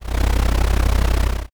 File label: cat purr